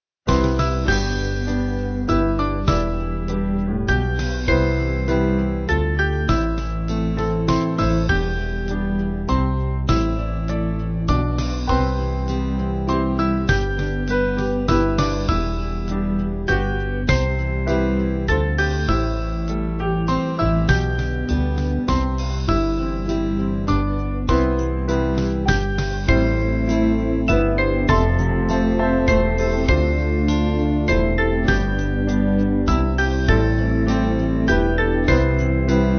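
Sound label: Band, Music Backing Files for Churches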